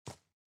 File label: SFX run rock